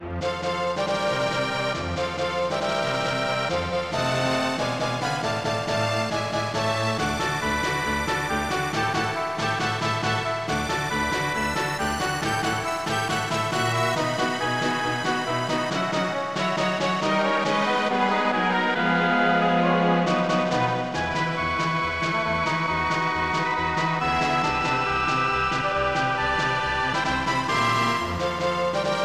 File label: In-Game Music; Shinri Game 3 1995 SNES